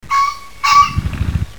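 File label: green pheasant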